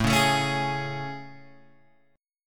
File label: A Major 7th A, Guitar, Open G